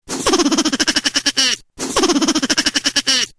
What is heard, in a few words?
Chipmunks Giggling Sounds Car Horn